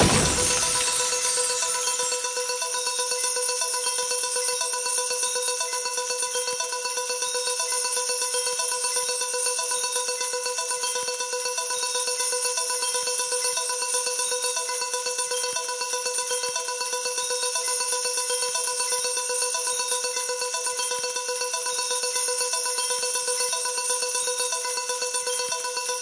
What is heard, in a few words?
big Win Loop; eldorado